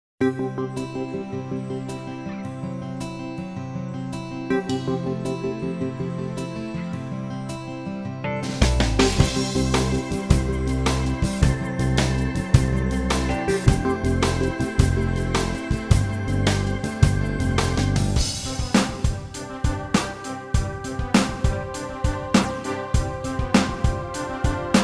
rock

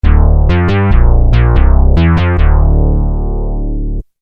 Moog bass

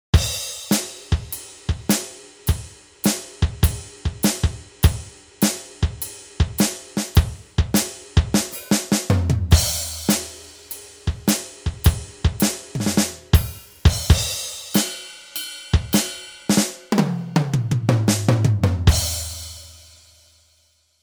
blues

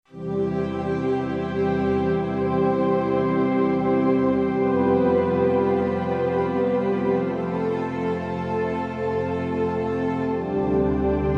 big pad

